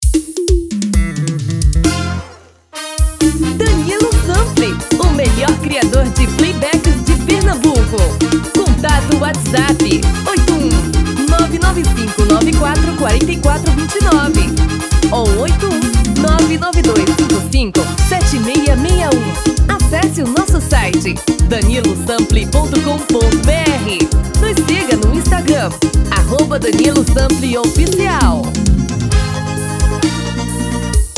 Forrozao

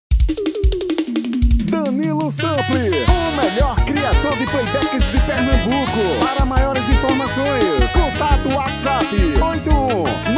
Xote

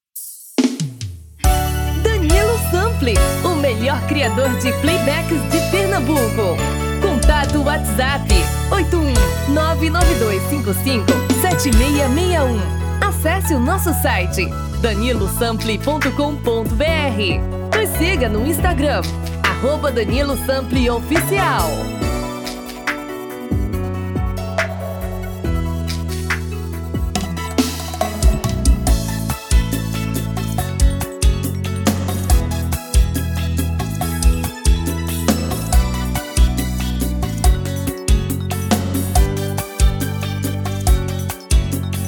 BREGA

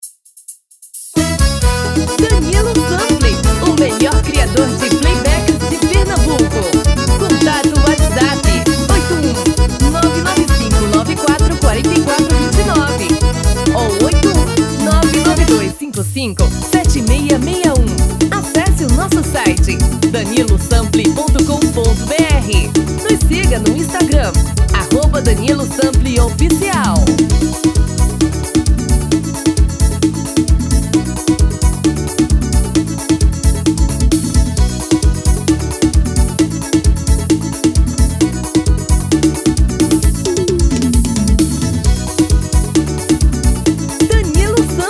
Forrozao

